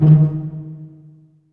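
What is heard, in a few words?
D#2 pizzicato, strings; AKAI S-Series CD-ROM Sound Library VOL-8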